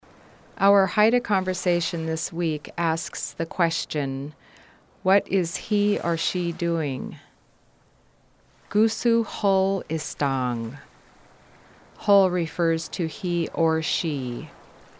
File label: Haida singing; k’ajúugang (is singing)